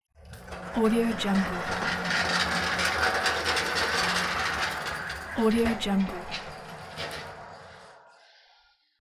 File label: industrial pallet truck passing by